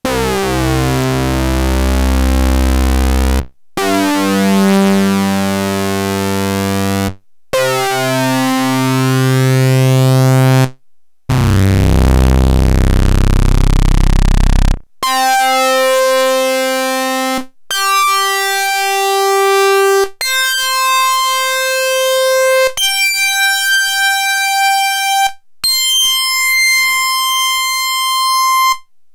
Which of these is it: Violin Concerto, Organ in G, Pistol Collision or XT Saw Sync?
XT Saw Sync